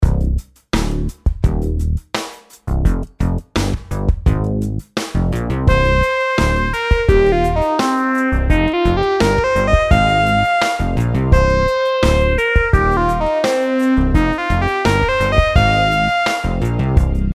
rhythm simple 8s triplets

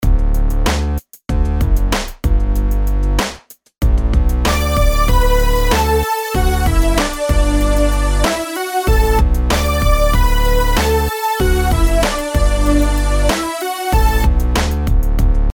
rhythms simple 8s major

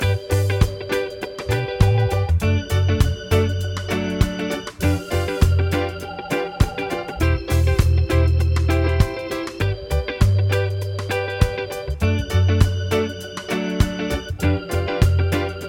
progs with applied chords

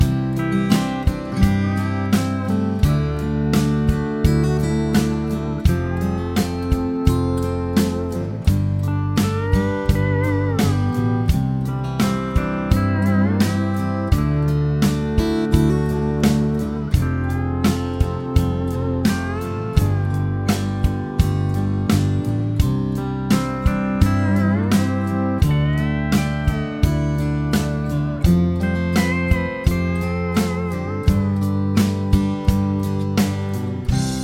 chord prog with mixture

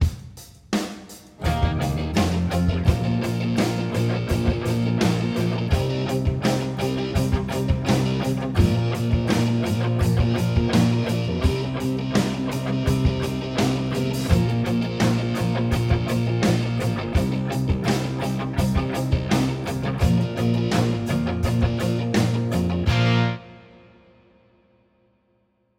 major key chord singles; harmony

